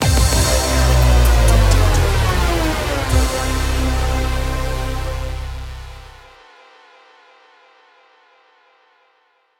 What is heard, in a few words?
Misson Failed; super time force ultra